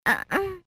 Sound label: baby sound